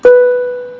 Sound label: seatbelt